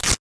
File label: Velcro, household rhythm samples hits